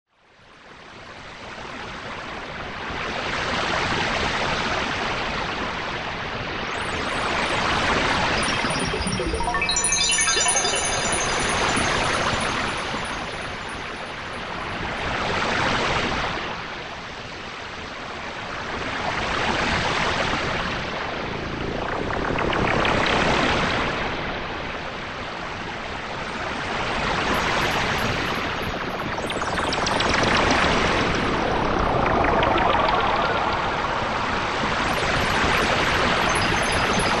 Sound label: Watery Texture